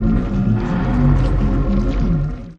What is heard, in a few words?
Entity Activate; Sid Meier's Civilization 4 Complete; Beyond the Sword; Units